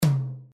tom, drums; standard

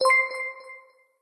money

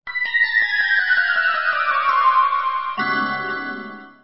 lose music; pvz

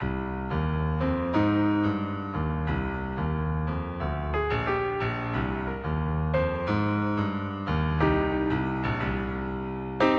boss battle piano

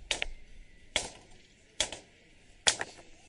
excavating

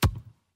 shoot

